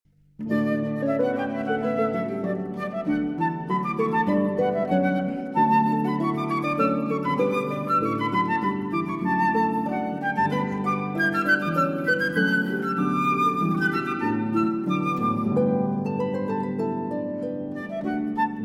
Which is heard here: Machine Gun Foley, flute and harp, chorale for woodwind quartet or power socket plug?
flute and harp